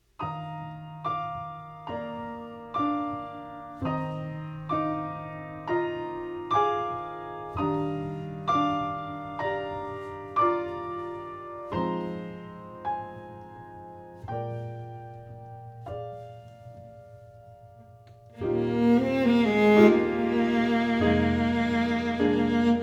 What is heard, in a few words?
Oboe; Classical Sounds